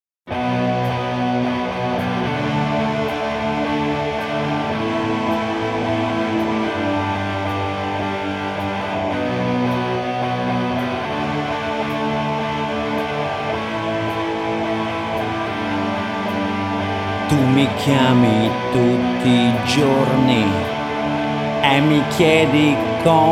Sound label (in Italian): Piano